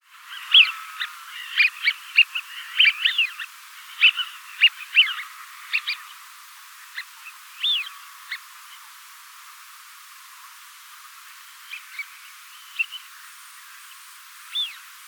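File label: sviyaz voice, Eurasian wigeon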